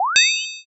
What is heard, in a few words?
draw sword